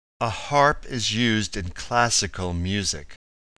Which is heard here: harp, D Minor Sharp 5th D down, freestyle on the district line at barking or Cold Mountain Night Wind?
harp